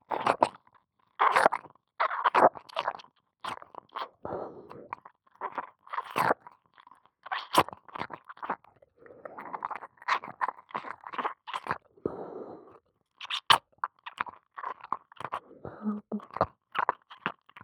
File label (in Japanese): 耳奥舐め